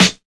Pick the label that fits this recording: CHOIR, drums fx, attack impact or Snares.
Snares